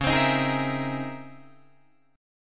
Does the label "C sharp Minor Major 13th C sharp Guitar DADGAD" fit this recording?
Yes